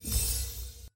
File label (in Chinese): 获得物品; nba